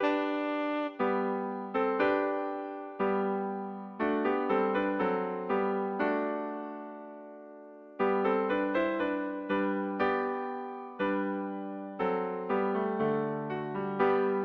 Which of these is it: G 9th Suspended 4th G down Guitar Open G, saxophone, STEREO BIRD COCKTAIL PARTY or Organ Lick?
saxophone